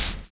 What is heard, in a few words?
SUB HH; Univers Sons - Kitch 70s